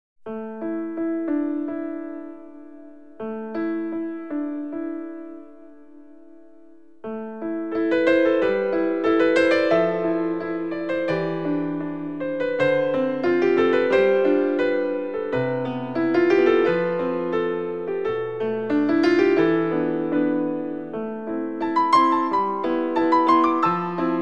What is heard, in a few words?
piano nocturn